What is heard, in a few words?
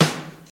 smooth bottom end acoustic snare E key, acoustic snare sound tuned to the E note